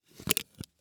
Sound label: Technology Electronic Flashlight Remove Batteries Plastic